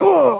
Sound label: pain; sven; player; cleansuit